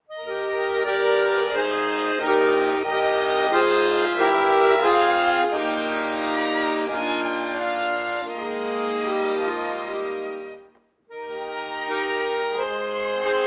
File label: Instrumental